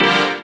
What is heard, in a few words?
synth house, dance synth; Sound & Vision - Gigapack I CD 2 (Roland)